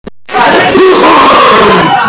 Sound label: haha